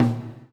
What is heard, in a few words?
GATE TOM, Drums Acoustic; USB Soundscan vol.10; AKAI; 90s sample CD; GATEKIT 3